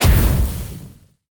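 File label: magic explosion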